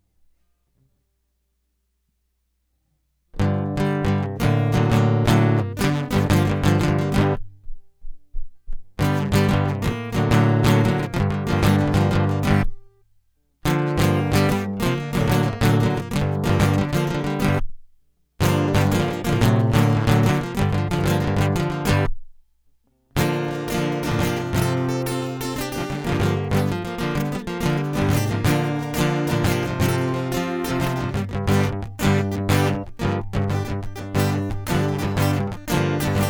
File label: Guitar Loop